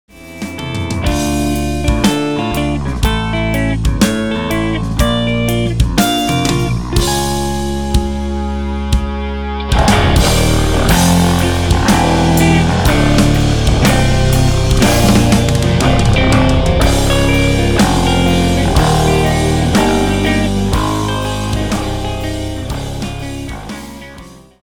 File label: Instrumental